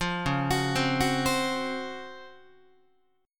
Db Major 11th down Guitar; arpeggiated; JGuitar

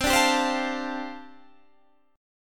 G 7th Suspended 2nd Suspended 4th C chord

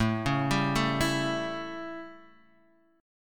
A 7th Guitar Standard, chord; arpeggiated; JGuitar

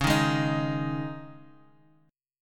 Db Suspended 2nd; strummed; Dbsus2; JGuitar

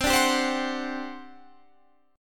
G Major 7th Suspended 4th, C

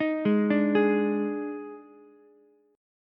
Eb Major 7th Suspended 4th, Ukulele; EbM7sus4 arpeggiated; 8,8,10,11; JGuitar

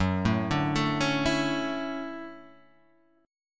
D-Major 9th F sharp

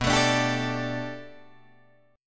B Minor 7th Sharp 5th B Guitar DADGAD, chord

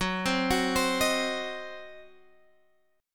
Eb Minor 6th Gb, guitar chord

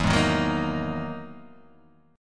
E Major Sharp 11th; chord shape

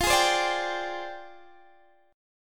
F Minor 6th Add 9th F down Guitar DADGAD

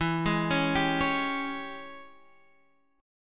Ab Major 7th Suspended 4th Sharp 5th E

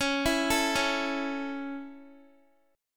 Db Minor Sharp 5th Db down Guitar; arpeggiated

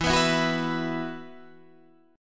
E Suspended 2nd

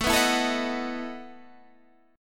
A 6th Suspended 2nd Sharp 11th, guitar

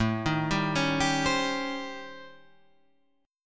A 7th Sharp 9th Flat 5th, Guitar, Half Step Down; A7#9b5

